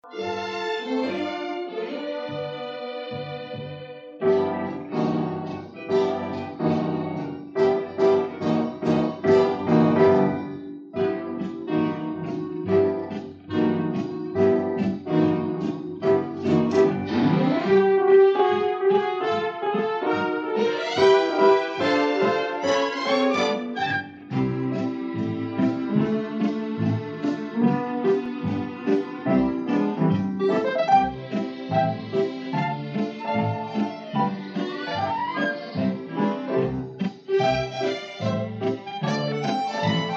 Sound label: Instrumental; Ichabod, Ichabod Crane